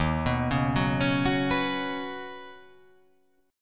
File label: Eb Minor 7th Sharp 5th, down; arpeggiated; chord; JGuitar